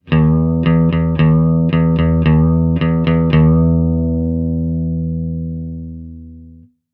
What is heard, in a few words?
Bass; Marshall; London-KB-1501